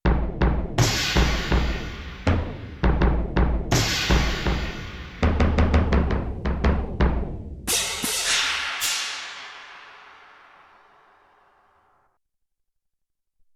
Deep Modulation, Cymbals & Bass Drum, Yamaha TX16W